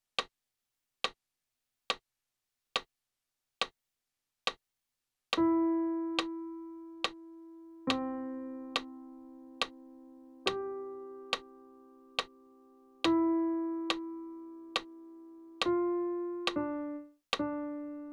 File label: Little Church Bells 75bpm